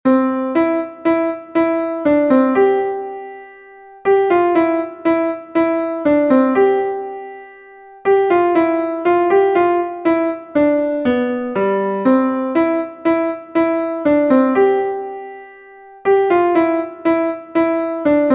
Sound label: Spring from The Four Seasons, Classical Piano